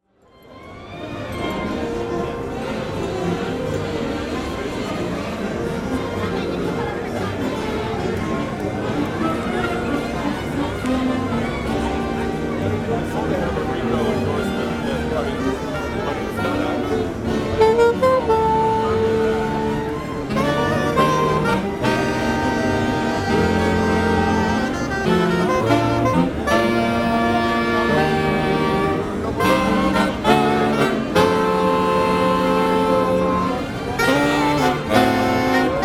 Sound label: Horn land; NAMM2020